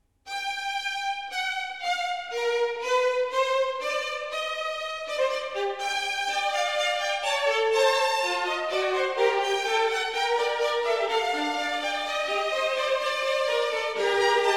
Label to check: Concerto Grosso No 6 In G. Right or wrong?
Right